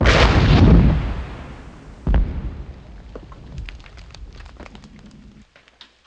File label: EXP LRG; HEAVY2